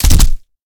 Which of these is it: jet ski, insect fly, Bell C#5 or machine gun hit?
machine gun hit